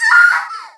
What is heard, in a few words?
Death Voice